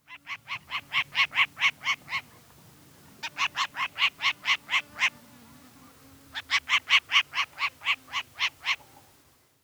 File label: Short-eared Owl